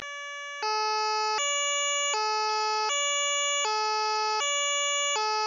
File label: federal signal; rauwers